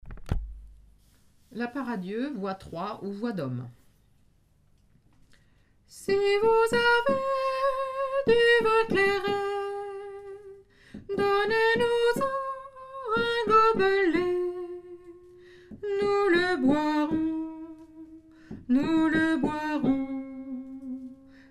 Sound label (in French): hommes; la part a dieu